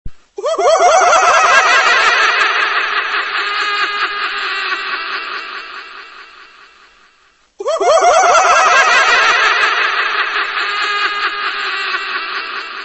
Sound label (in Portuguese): Risada Fantasma, sons de risadas fantasmas